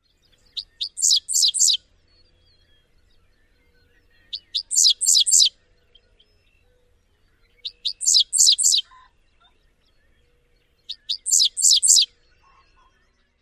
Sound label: song